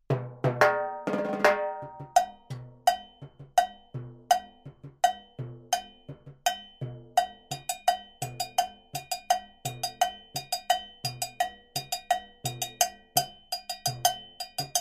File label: LP cowbell